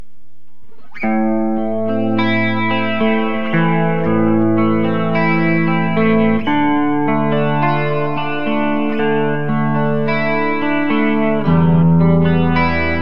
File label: neck clean; classic57; Burny